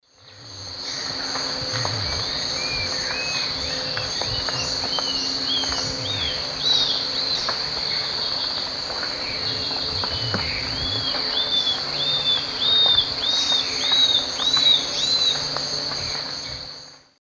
Myrmotherula menetriesii, duet, S. America Eastern Lowlands